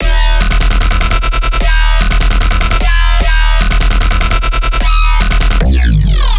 Bass Loop D#, Dubstep, 150bpm; Quadstep; Ghosthack; Dubstep Sound Arsenal

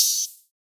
Open Hihat; Cymatics; Humble Hip Hop Sample Pack; Cymbals

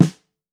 Steel, Snares; Drums; Ghosthack - Free Snares 2023

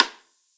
Rim, Afrobeat; Drums; One Shots; Ghosthack; Drum Mega Bundle

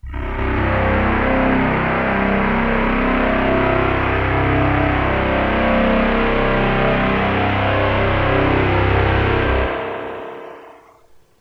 BASS (C), Pedal One Shots; Covers; Cymatics; ANALOG EVOLUTION Beta

